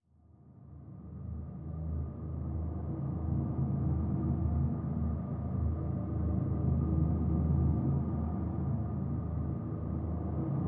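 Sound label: drone simple D; Drn Bleeding Out Simple D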